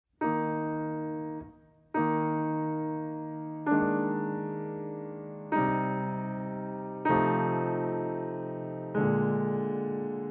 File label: wild rose accompaniment